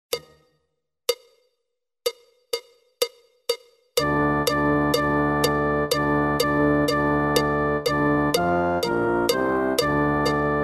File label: Jingle Bells Fast; Beginning Band Click Tracks (Holiday Concert)